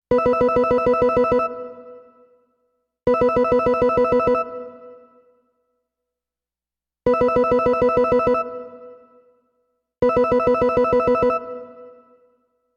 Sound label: Cuckoo